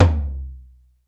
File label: BEAT KITS, T; Crypton - DrumBase5000